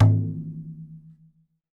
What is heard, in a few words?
MADAL, DRUMS OF IND; AKAI S6000 CD-ROM; WorldSounds